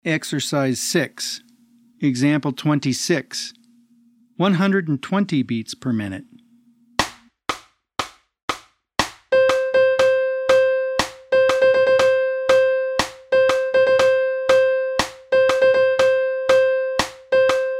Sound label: 120 BPM